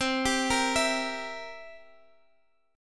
C 7th C, Guitar Open D, chord

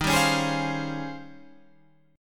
D sharp 13th, Guitar, Half Step Down

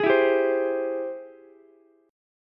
G sharp Augmented Major 7th, Ukulele; strummed; JGuitar

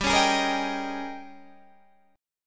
D sharp 11th G

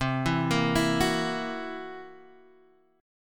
C 7th Flat 5th C down, chord; C7b5 arpeggiated; JGuitar

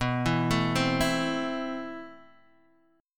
C 6th B, C6/B chord; C6/B arpeggiated

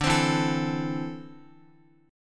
D 7th Suspended 2nd, D, down, Guitar; 4F,4A,5C,5G,5C,6E; strummed; JGuitar

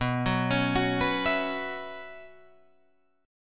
B Suspended 2nd Suspended 4th, Guitar, Drop D

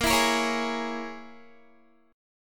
Bb Minor 7th Flat 5th, Guitar; strummed; 4E,4A,5D,5G,5C,6F; 18,19,20,18,20,x

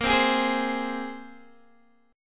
Bb 7th Suspended 2nd Bb, Guitar DADGAD; Bb7sus2 strummed

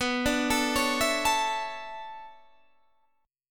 B Minor 11th Guitar Standard, Bm11 chord; Bm11 arpeggiated; 19,17,19,18,17,17; JGuitar

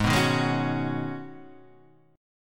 G sharp Minor 11th G sharp, chord

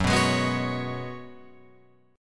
Gb Suspended 2nd Suspended 4th, Guitar, Open F; Gbsus2sus4 strummed; 6,6,8,6,9,8

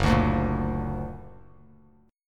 Gb Augmented, Bass Standard; 2,5,0,3; Gb+ strummed

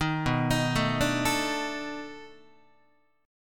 B 7th Sharp 9th, down, Guitar; arpeggiated

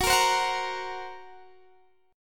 F sharp Major Flat 5th F sharp Guitar Standard; F#Mb5 strummed

